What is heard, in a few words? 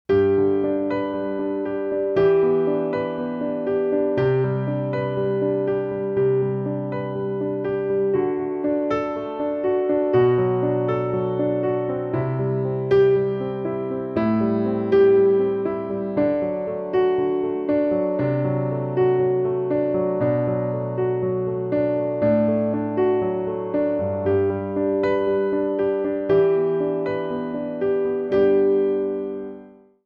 solo piano